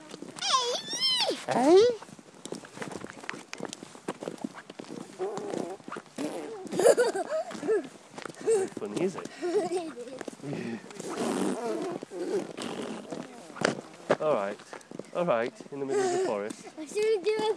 oh pig

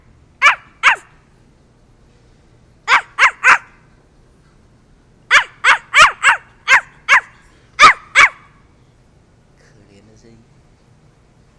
barking

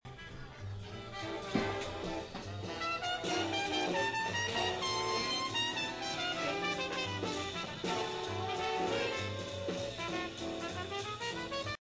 live music

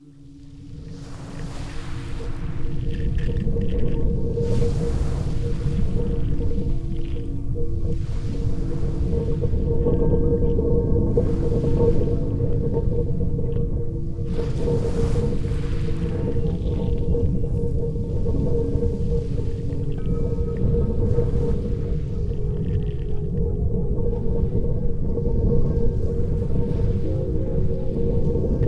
an electronic soundscape from dungeness tower

